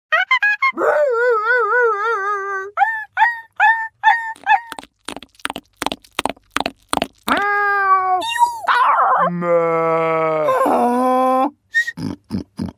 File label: animals and sfx